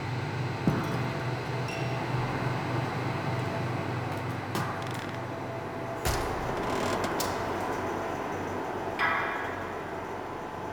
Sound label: Interior Hub Night Club Bed Loop, Ambience; Warframe; Nineteen Ninety Nine